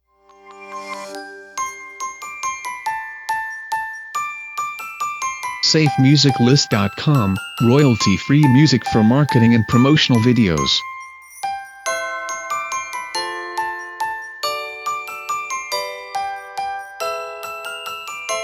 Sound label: Merry Christmas; SafeMusicList